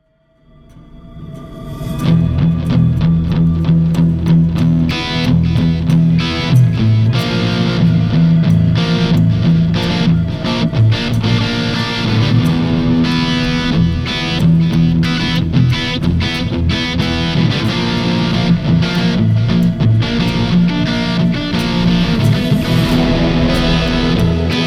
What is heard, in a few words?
Solo, Crescendo, Delay